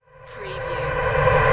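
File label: Production Element Reverse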